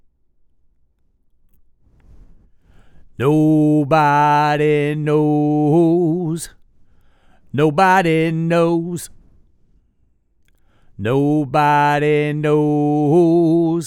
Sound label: vocals